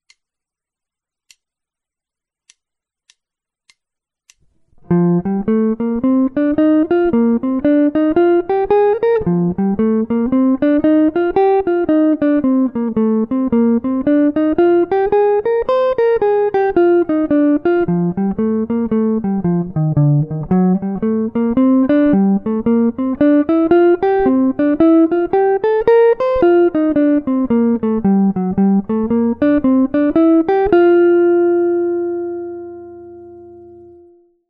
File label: guitar jazz blues; wes montgomery guitar jazz blues lesson